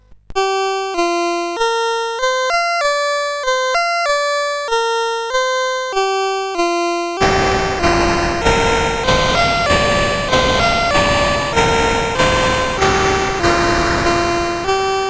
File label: sid CSG8580R5, GAMES; Cambodia Raid